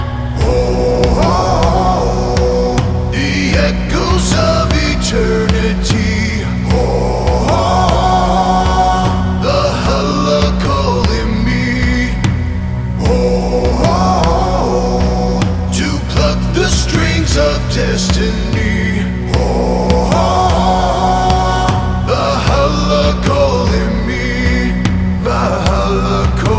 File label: Viking Ringtone